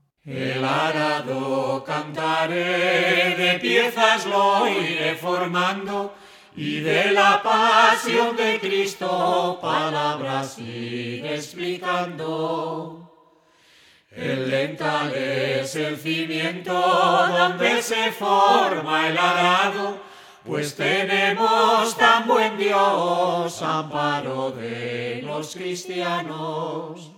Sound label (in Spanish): canto de pasion, el arado